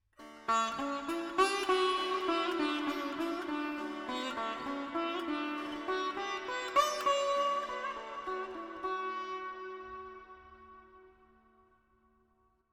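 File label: Veena, melody, 7-8, D, 100 bpm, FX; Sample Banks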